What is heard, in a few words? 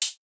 synthetic puff